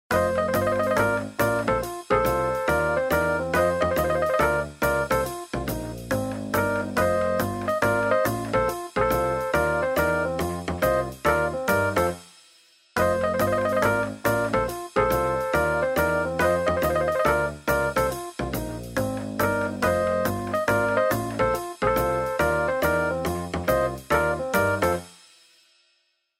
Jazz Band, Ringtones; A395; LG

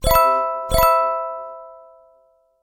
Message Chime; UN200 Saber; LG

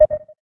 Low Battery

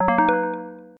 open, folder; T390K Wine 3G; LG

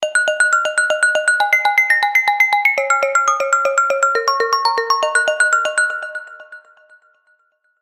Glass Material, ringtones

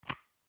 KEYLOCK; Spreadtrum source code audio

